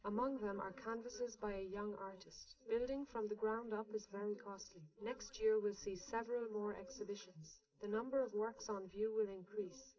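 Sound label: female, live recording 130ms 1m; SiSEC11; underdetermined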